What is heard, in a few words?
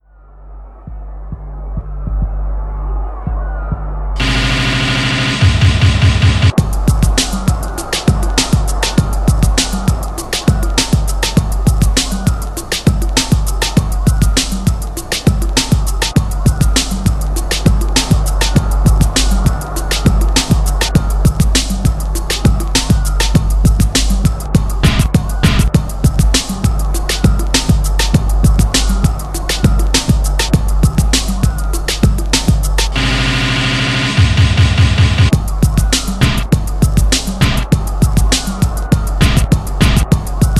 Instrumental Bounce Beat